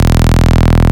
Bass Shot E

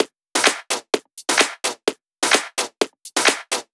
Loop, 128 BPM

